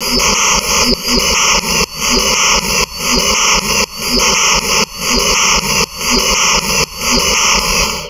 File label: Drum Loops Crazy Processed; USB Soundscan vol.07; AKAI